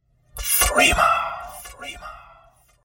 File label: alien ringtone; threema